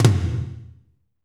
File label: TOM, Kit Dry; Northstar - Drumscapes Roland